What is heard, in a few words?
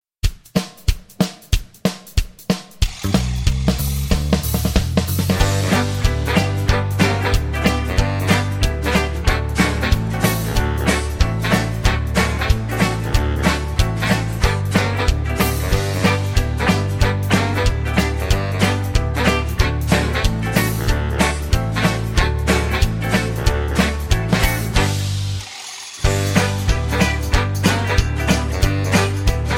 (G To Ab) No Backing Vocals